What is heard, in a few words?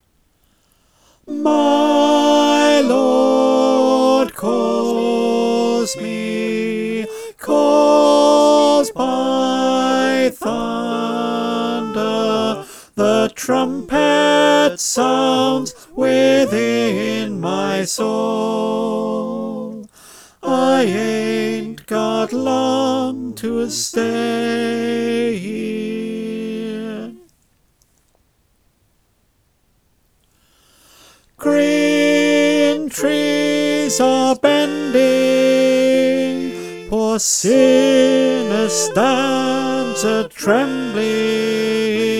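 Tenor, choir; Steal Away v1&2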